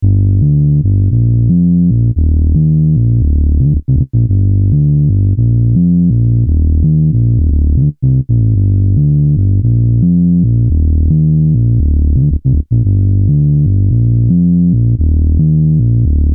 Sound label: Synth loop 112 Gm, Synth Bass Loops; Slate Digital BASSHOLE Sample Pack